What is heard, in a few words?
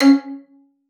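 violin spiccato C#4; Xsample VOL-5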